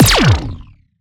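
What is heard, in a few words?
Sci weapon Shot Small Laser Zapping Pulse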